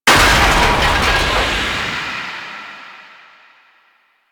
Golen Maw Gate Smash; Ambience; Warframe; The War Within

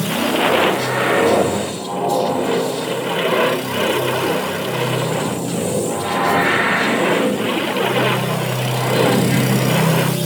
Corpus Ship Vent Room Reactor Loop; Ambience; Props; Warframe; Corpus Ship Remaster

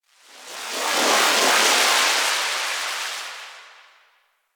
Zariman Power Rift Buff; Warframe

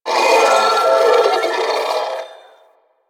Sentient Symbilyst Attack; Enemies; Barks

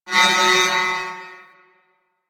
Security Camera Start; Sentient

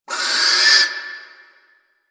Owl Critters Vocal Alerted; Warframe; Ambience; Duviri

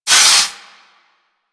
Forest Water Pump Engage; Ambience; Props; Warframe; Grineer Forest

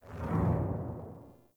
Word Upgrade Menu Exit; UI; Word Upgrades

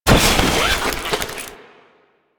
Military Backpack Destroy; Objects; Warframe; Storage Container

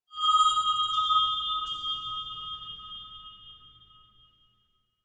Diorama Shard Lens Flare; GlassQuest

